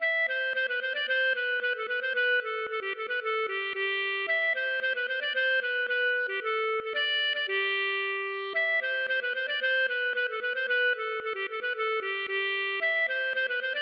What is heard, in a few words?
galop; Nantais